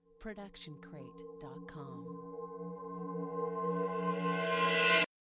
horror rising bell; soundscrate

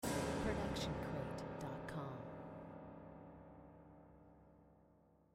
menacing low piano hit

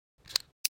switch clicks; soundscrate

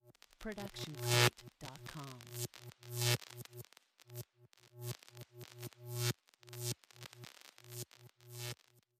electricity hum sparks; SoundsCrate

